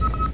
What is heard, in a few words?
Target Off; Realmz 5.1.6